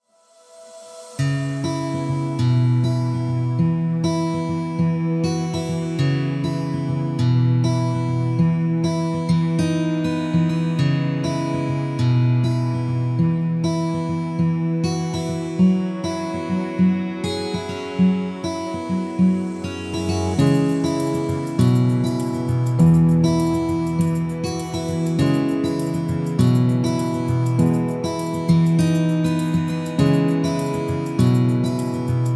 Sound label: acoustic folk music guitar